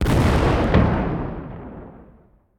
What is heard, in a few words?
Explosion; LOOPERS